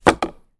household swimming pool filter net down on wooden decking